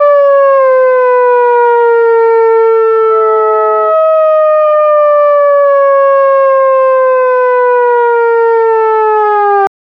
distant sirens wailing we, emergency vehicle

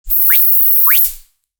minimal luxury perfume

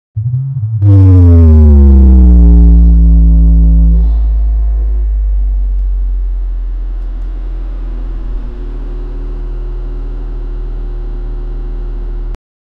futuristic technological

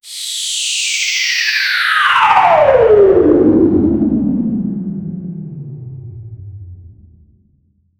a scary fast paste timela, time lapse

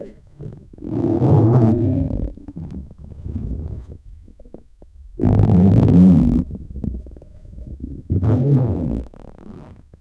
smooth ball continuously; movement

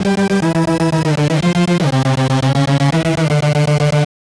rolling synth slot machin

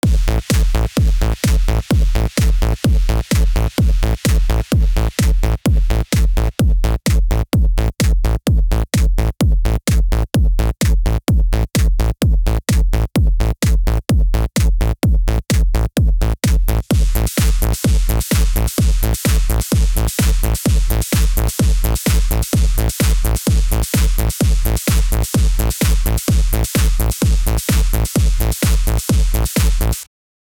128 bpm; high quality mastered; SFX Engine

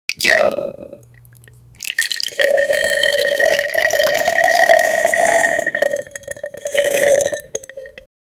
slurp slurp noodles; chewing

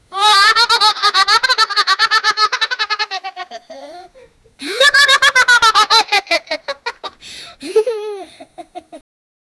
9 year old african, laughing

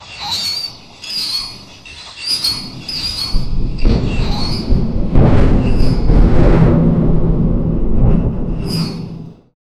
parrot chattering monkey

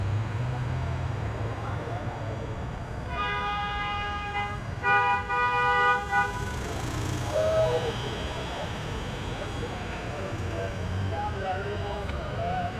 busy city cars honking; bicycle

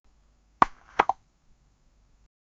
photoshoot sound, camera

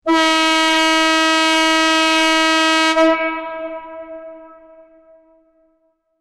echoing horn blast hooonk; vehicle

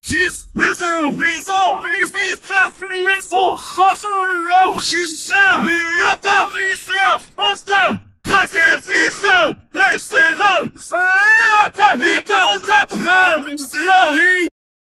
white blood cells fight; biology

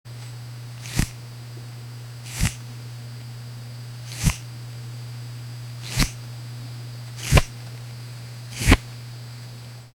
the firm tense sound of, adjustment

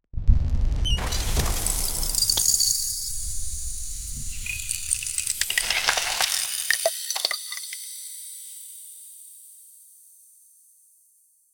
magical ice cracking with; arcane

